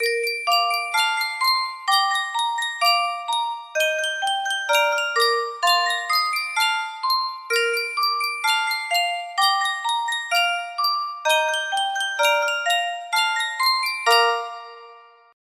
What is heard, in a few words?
yunsheng music box